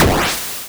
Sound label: hard, explosions